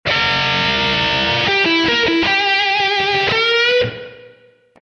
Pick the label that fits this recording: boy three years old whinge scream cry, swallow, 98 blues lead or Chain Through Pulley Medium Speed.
98 blues lead